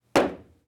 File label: Things falling on floor